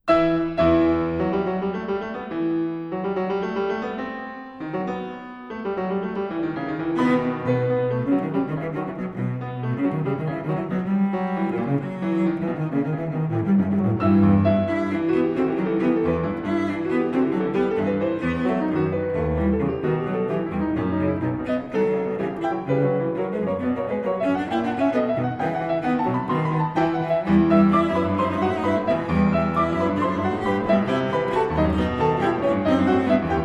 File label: Sonata for Cello and Piano in E Major Allegro; Brahms